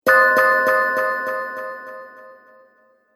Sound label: Fairy Strong Spell, Magic Sound Effects